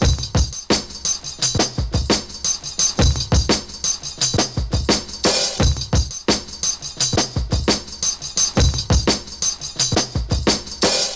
Flute Drums; Ableton; Breakbeats by KutMasta Kurt

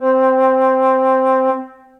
Alto Flute Solo Legato Vibrato; Ableton; Orchestral Woodwinds

